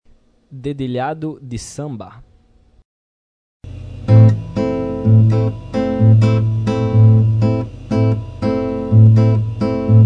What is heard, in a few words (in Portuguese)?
Dedilhado de Samba